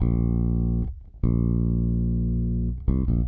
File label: Electric Bass; Basses